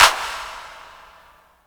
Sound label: clap